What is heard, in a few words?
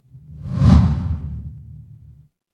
transition whoosh